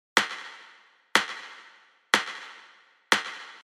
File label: Claps (Gm 122 BPM)